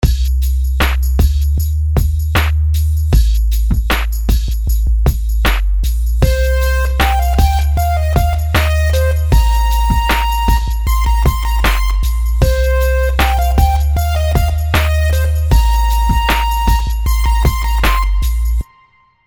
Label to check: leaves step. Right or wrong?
Wrong